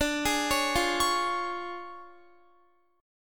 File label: D Minor Major 7th Flat 5th, D, Guitar; DmM7b5; arpeggiated